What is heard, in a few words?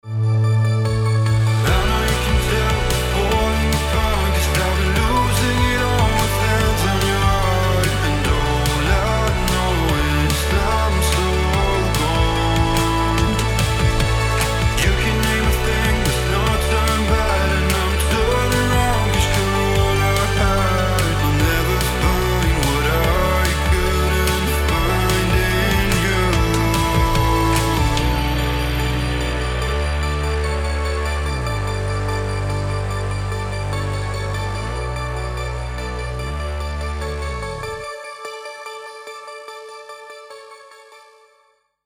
Sample Pop Mixed